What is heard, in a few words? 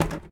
Footstep Plastic Tech